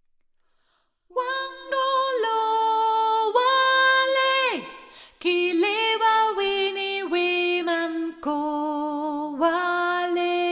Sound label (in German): Sopran